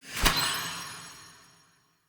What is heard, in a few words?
UI Default Invite Received